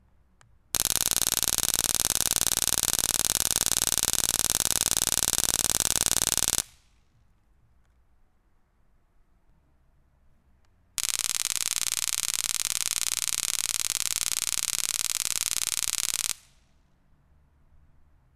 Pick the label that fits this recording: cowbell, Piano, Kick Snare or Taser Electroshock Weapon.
Taser Electroshock Weapon